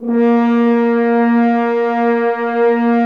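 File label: french horns dry; Roland L-CDX-03 Disk 2; F.Horns 2 f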